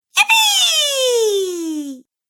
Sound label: Cartoon Girl "Yipee"; Cartoon Voice; Cartoon Voice Sound Effects